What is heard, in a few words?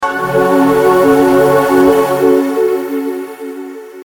ethereal